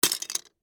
Legos Drop Box, Single, Piece, Plastic, Toys

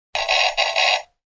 Funny Toy Sound; Funny Cartoon Sound 3; Funny little cartoon sound; Children; Human

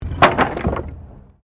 Wood Fall

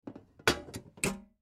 Fuse Box Close Door, Fuse Box Close Door Panel; Fuse Box Close Door Panel Slow; Household; Basement

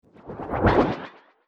Rippled Swish

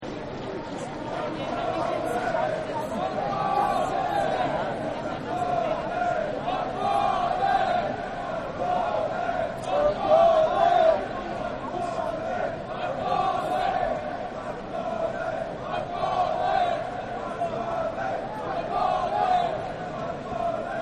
Stadium Ambience Chants; Crowds; Sound Effects:Backgrounds; Partners In Rhyme

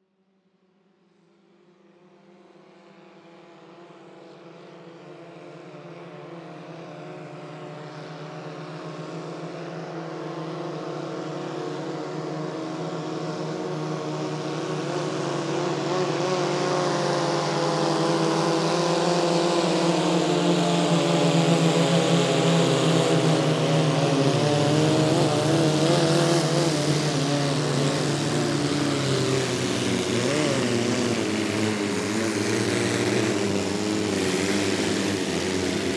Swamp Jeep Pass By Multiple Fast; Transportation Watercraft; Boat Sound Effects; Boats (Sound Effects:Vehicles); Blastwave

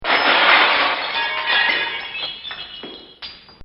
Glass Plate Breaking; Glass (Sound Effects:Materials)

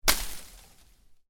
Foliage Impact, Medium Foliage Hit; Miscellaneous Impact; Impacts; Stuart Duffield

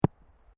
Sport Ball Rubber Bounce Grass; Bounces; Objects

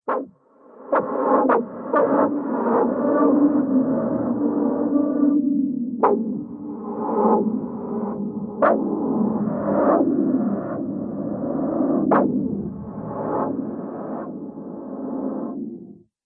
Magic Surreal Soundscape; Magic Sound Effect

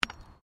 Digging Small Handheld Tool Rocks; Various digging tools and processes; Construction

